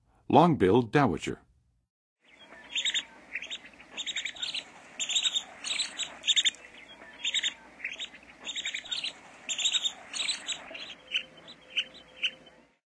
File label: Long-billed Dowitcher, Songs of Birds of North America; Western USA; Western USA Songbirds